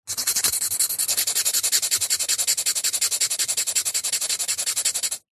foley wood thin strips rub together fast trying to light fire; zapsplat